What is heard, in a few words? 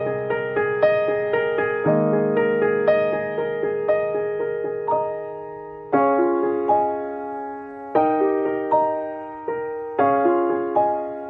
Ringtone Instrumental